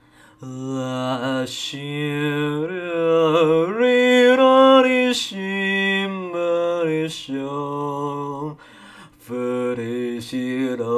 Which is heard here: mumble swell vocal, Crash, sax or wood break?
mumble swell vocal